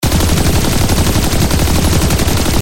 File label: shooting headshot